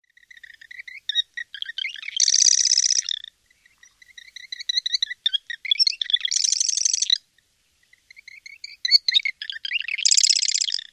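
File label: song eastern subspecies, marsh wren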